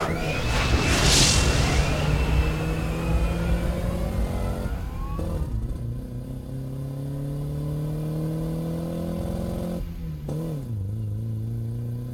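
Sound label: engine high jet; Blind Drive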